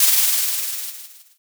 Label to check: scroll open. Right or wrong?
Right